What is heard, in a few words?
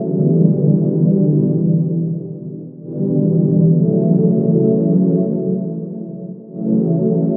Pad Loop 130 D, Techno; Loops; Pads; Ghosthack; Free Techno Sounds 2024